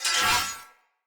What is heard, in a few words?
Melee Whoosh Accent; Weapons; Player; Swords; Short Sword; Accents; Dendrit Short Sword; Vetch